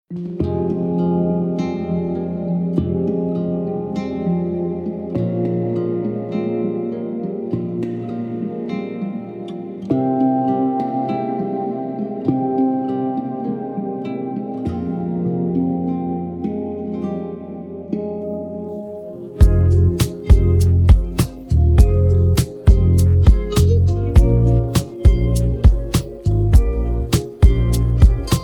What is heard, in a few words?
instrumental; persian